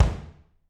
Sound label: BD, Drums; Bob Clearmountain Drums I